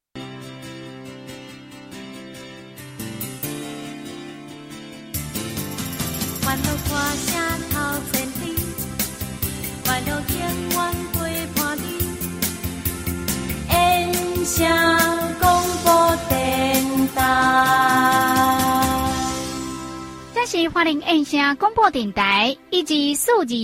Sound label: jingle; yansheng bc